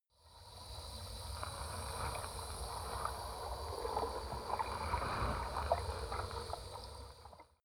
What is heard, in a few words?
sea